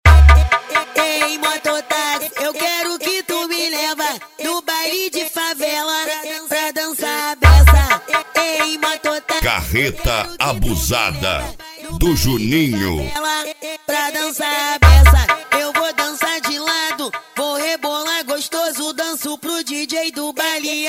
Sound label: mega bass